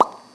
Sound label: plop; edgar; boss; blob boss